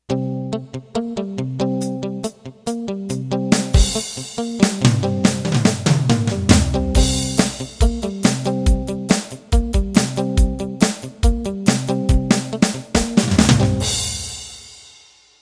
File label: Country Boogie Intro